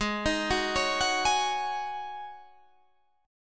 C sharp Suspended 2nd Suspended 4th, G sharp

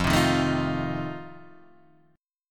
F 7th F down; F7 strummed

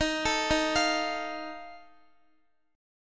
Eb Suspended 2nd Flat 5th, Guitar; Ebsus2b5; arpeggiated

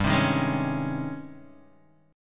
Ab Major 11th G

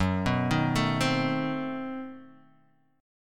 B 7th Flat 9th, guitar

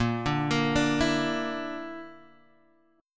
A sharp Major Flat 5th, Guitar, Half Step Down

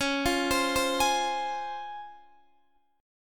C sharp Major 7th C sharp Guitar DADGAD

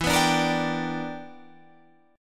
E Minor 7th Sharp 11th E; strummed

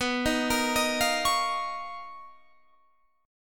B Major Sharp 11th, Guitar, Standard; BM#11; arpeggiated; JGuitar

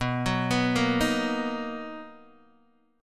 B Major 7th B down Guitar DADGAD

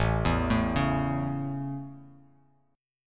A Major, Bass Standard; arpeggiated

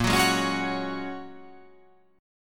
A sharp Minor 7th Sharp 5th A sharp

